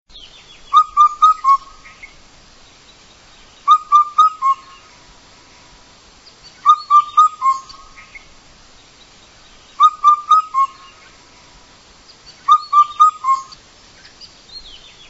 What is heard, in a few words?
bird song